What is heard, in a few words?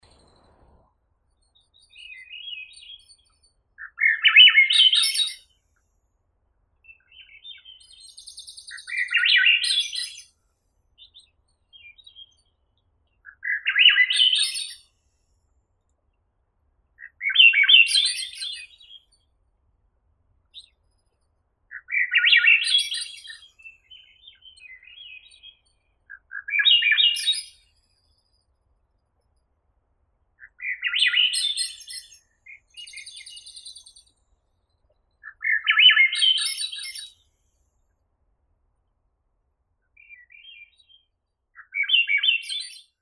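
swainson's thrush, catharus ustulatus; drozd svensona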